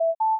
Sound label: permission bell